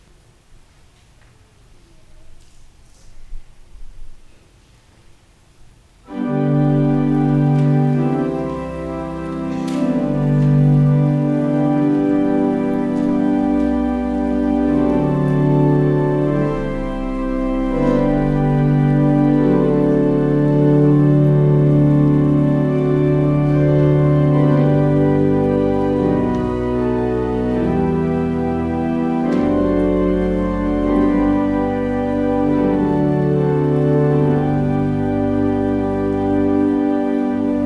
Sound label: Organ Music; The Strong Man Overcome; luke 11 21 22